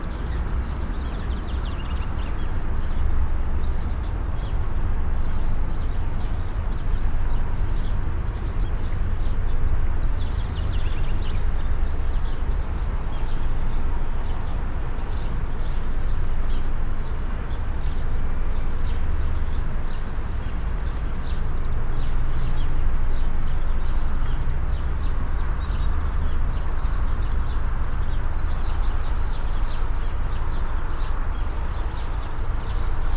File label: Urban park day birds traffic rumble; urban and suburban; boom library